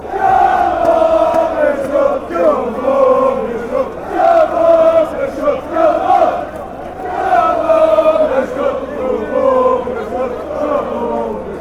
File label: beerschot chant